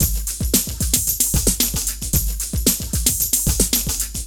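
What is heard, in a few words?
drum loops, left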